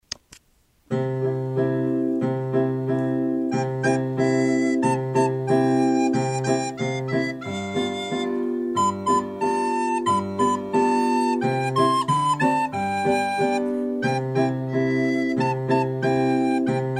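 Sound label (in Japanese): 山のポルカ（メロディ①）, リコーダー; 成隣小学校 WEB音楽室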